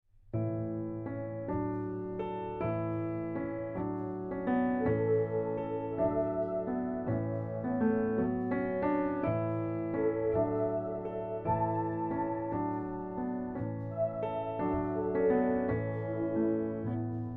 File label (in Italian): Ninna con pad